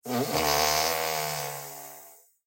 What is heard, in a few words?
Fart Noise; SoundsCrate